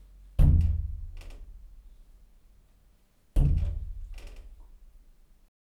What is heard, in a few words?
Kick